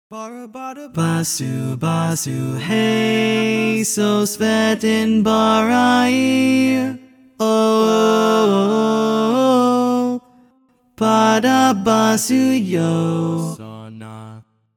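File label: TTBB baritone, barbershop tags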